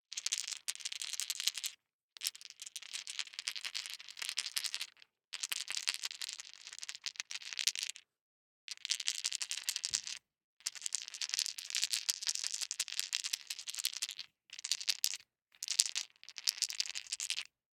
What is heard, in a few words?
DICE in One Hand, Shake, 5 Five Dice; Samples; ArticulatedSounds; DICE Sfx Party (Extended)